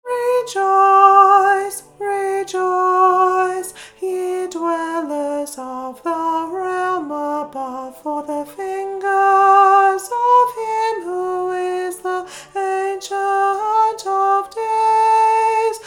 alto

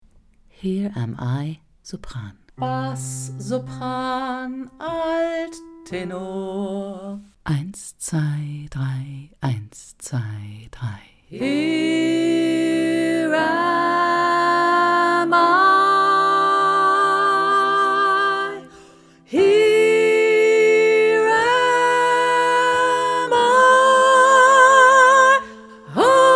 soprano; here am i

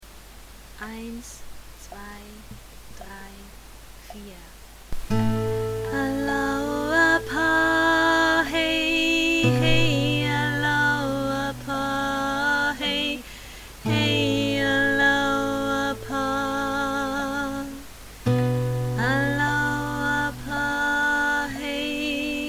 maori alto, choral music

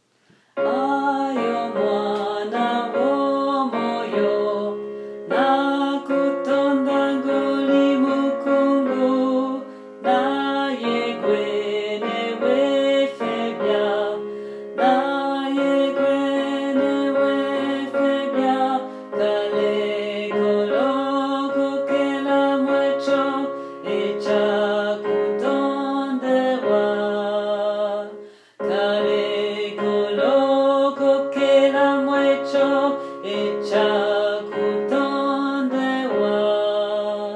alto

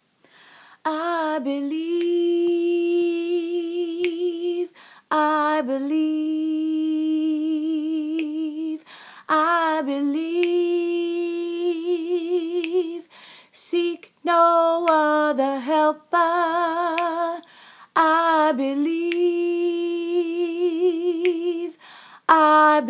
alto; be thou content

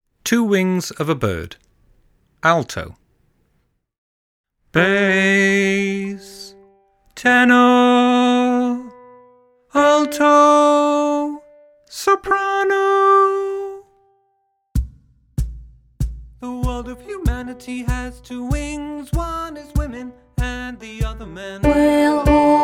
alto, choral music

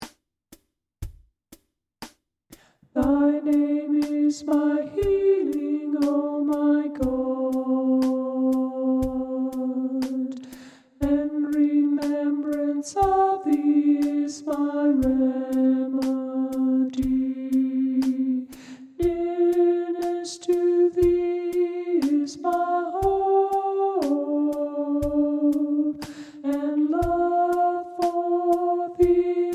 alto, choral music

